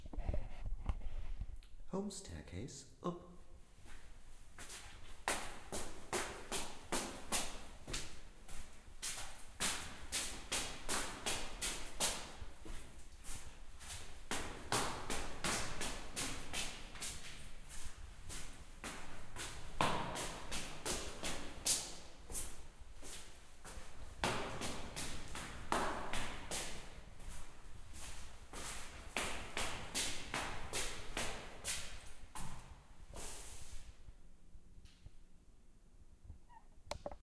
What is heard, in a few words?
small staircase up; Six flat appartment house, two flats to each storey, tiled floors, plastered walls and big windows to the front.